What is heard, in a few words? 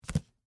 Bible Place Hand, On, Book; Foley